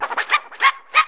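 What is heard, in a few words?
scratch L, vinyl FX; E-Lab; X Static Goldmine 2; akai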